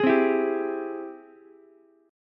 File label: Ab Augmented Major 7th Ab Ukulele; strummed; 13,12,12,10; JGuitar